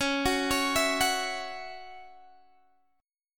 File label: Db Minor Double Flat 5th Db Guitar DADGAD